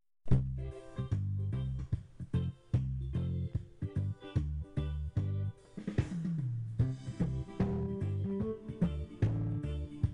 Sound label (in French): acc reggae basse